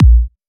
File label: Kick; Ultimate Kick Collection